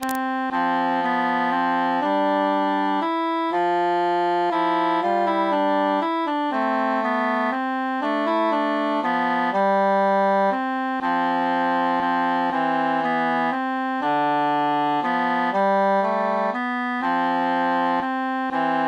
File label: cantus mute; li jalous par tout sunt fustat tuit cil qui sunt enamourat veritatem